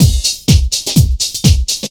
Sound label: drum 125, drumloops, Vocal House; PS-28 Vocal House Samples; basic